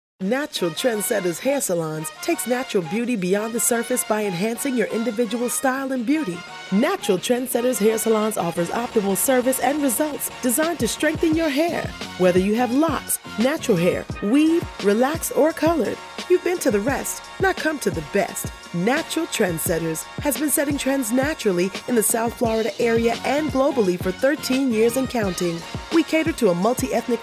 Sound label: Radio music; NTS